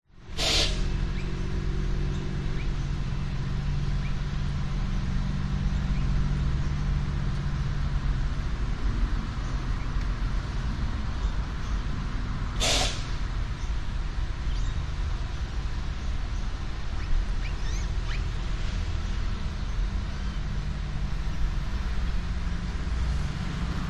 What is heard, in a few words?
industrial cement works machinery hum air bursts birds